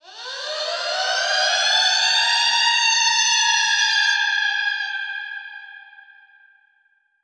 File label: Echo Proc B, alarm siren samples, 120bpm; musicradar